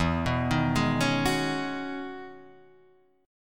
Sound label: E-Major 13th, chord; EM13 arpeggiated; 0,2,1,1,2,2; JGuitar